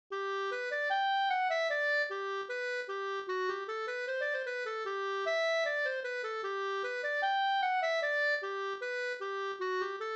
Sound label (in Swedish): reinlender; e Edvard Soderlund Enviken Da